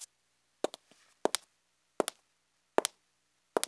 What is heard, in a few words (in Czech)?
chuze; Mafie 1 cz